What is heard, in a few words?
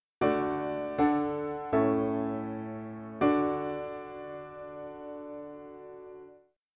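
piano, dictation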